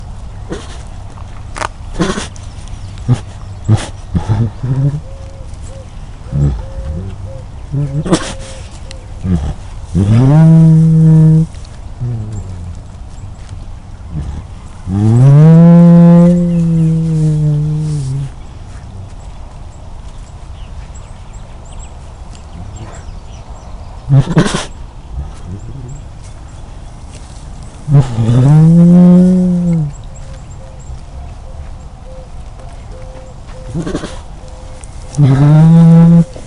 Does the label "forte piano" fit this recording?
No